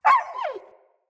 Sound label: Wolf Pain Yelp; Animals